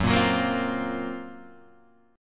F sharp Major 7th Flat 5th, Guitar, Half Step Down